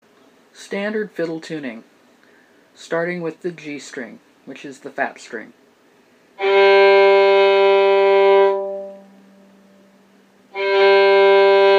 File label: Fiddle Tuning Standard Tuning